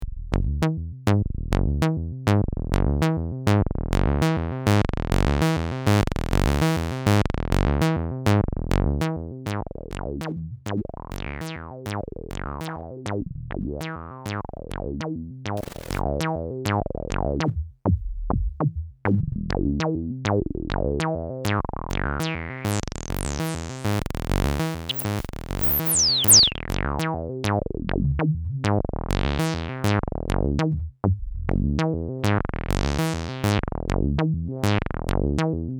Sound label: ResoAcidfilter 24dB; system700